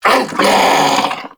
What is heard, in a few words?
Vocal Attack; Warframe; Duviri; Dax